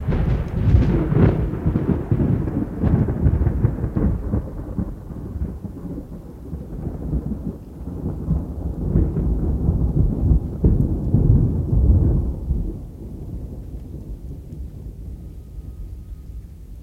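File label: Thunder Crack Large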